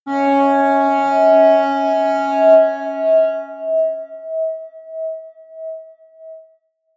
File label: atmospheric feedback synthesizer high, drones